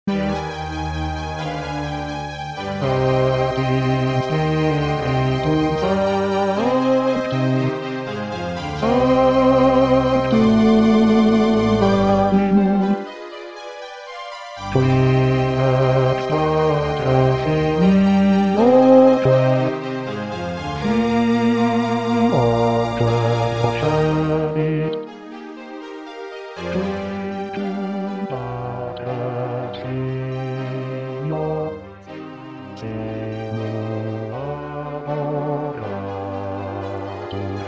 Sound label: Bass; Puccini; Messa; Credo; spiritum